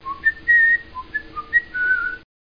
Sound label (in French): sifflotement; Reperages 94; tortue